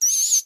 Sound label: mice hurt; minecraft; technic